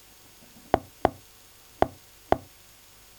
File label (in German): Balz-Klick Maennchen